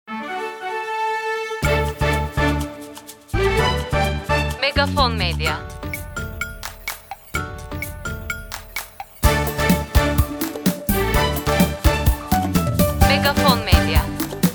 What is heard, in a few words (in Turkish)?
TV REKLAM MÜZİĞİ; İCONOVA